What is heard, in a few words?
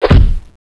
punch wall